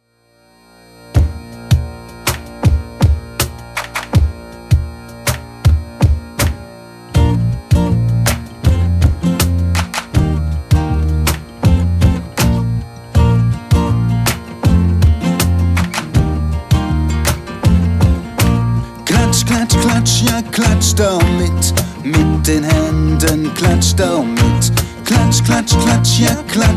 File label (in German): klatsch und stampf